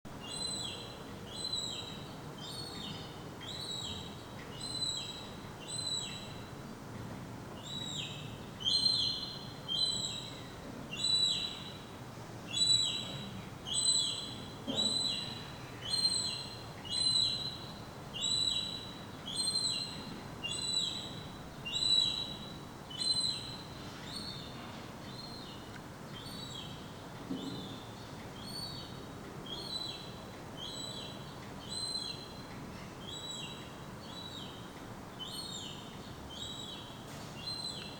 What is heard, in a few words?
baby seagull